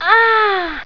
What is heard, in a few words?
female pain